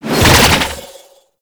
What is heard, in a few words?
Archwing Prime Sword Short Whoosh; Warframe